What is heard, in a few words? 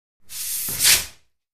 Ping Pong Paddle